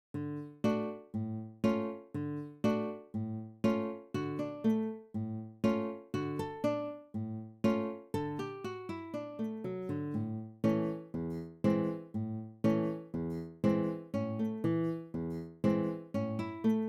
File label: Polka (D Major)